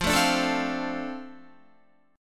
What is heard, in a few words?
F 6th, Guitar; F6 strummed